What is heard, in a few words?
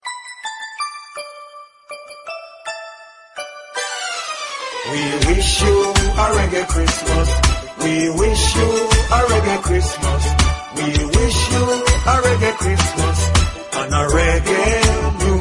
Happy Christmas, English Ringtone